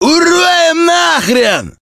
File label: attack; metrocraft; customnpcs